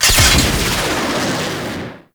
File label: Rocket Pack Launch; Enemies; Attacks; Warframe; Grineer Ghouls; Ghoul Devourer